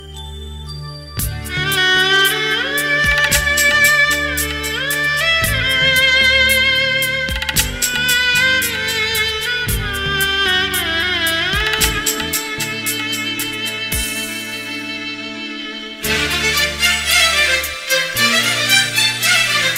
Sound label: Deep Sad Malayalam Ringtone